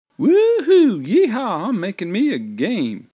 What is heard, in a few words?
whoo Hoo